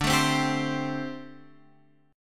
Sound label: D 7th Suspended 4th D Guitar Open F; strummed; x,9,9,7,10,7; chord